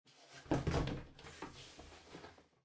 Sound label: Cardboard Box Far Fold; ダンボール; 遠い 畳む; 梱包材